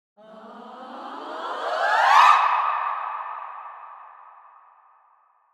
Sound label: choir effects 1, 145BPM